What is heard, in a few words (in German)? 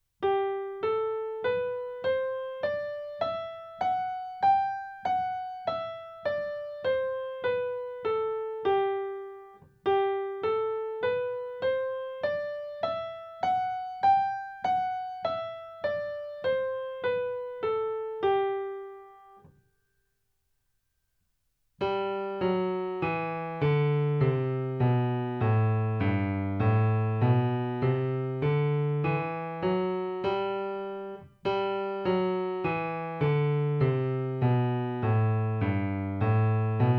G Dur Tonleiter Übung